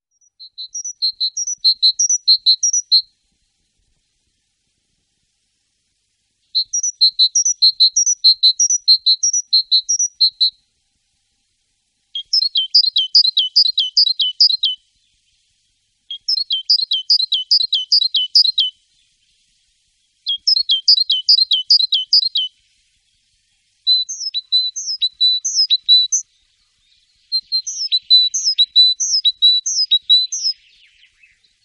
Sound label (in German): Kohlmeise, Voegel; Parus major